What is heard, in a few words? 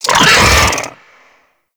Infested Ancient Pain, Enemies; Warframe